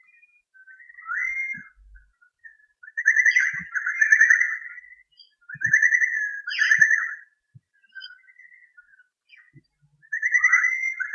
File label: armidale birds